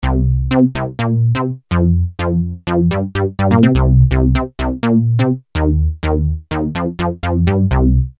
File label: Classic Saw Bass